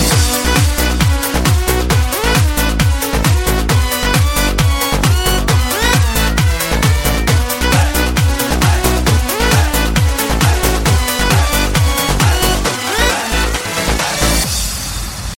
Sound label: Beats Remix